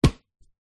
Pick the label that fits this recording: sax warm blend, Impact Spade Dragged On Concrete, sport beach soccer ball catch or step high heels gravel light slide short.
sport beach soccer ball catch